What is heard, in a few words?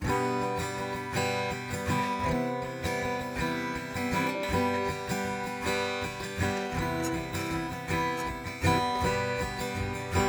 Uplifting Delightful; Jamie Coleman, Film Composer